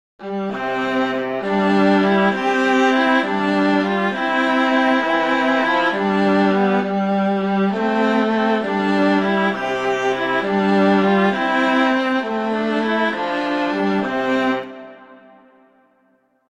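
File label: I Saw Three Ships, Christmas flute quartet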